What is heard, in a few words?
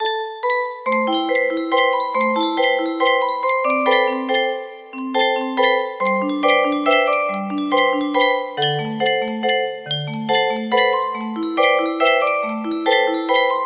melody